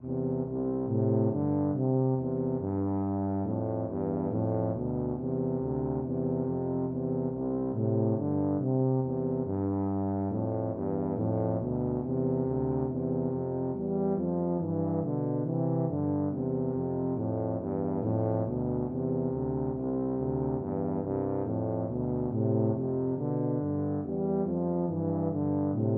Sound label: good king tuba duet